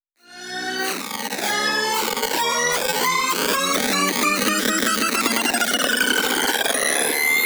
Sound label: riser; M8; riser sfx